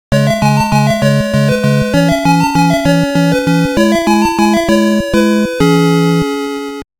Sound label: Game Over; Loopz; NES